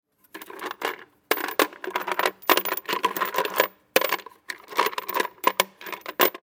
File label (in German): Schrauben